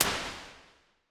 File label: Plates Modern Snare Plate L; Impulse Responses; Ableton Live 12 Lite